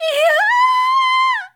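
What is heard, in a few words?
woman scream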